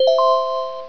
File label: Mail Notification; Magnet 1.0b27